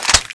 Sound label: clip out; tfa cso; k3